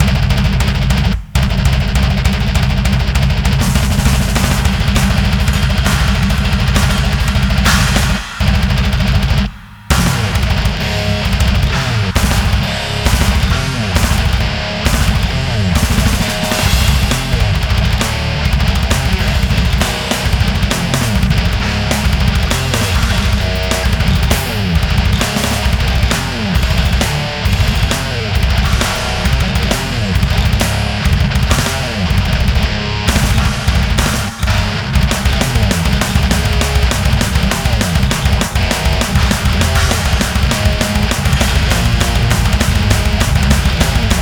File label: Dark Fury Fast Heavy Thrash metal; CUES for film TV games etc